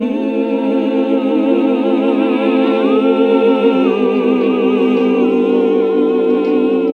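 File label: classical singing; Sound & Vision - Gigapack II CD 1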